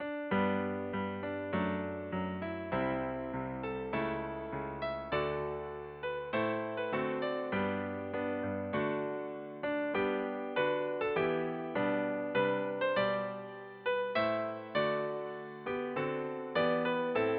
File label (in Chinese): 校歌 純音樂